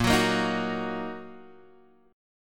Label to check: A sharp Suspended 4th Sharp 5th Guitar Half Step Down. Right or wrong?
Right